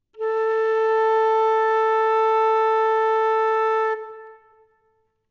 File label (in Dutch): Stemtoon 442 Hz